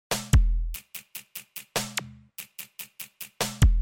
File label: dancehall